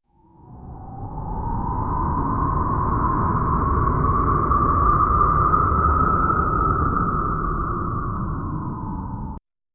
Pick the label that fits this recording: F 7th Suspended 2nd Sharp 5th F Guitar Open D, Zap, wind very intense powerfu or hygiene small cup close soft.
wind very intense powerfu